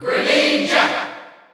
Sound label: Cheer Dutch; Greninja; SSBU